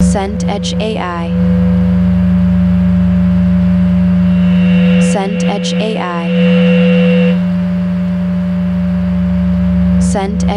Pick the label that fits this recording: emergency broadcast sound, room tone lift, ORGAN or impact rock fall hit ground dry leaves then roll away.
room tone lift